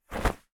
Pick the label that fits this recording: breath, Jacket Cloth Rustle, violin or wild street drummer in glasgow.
Jacket Cloth Rustle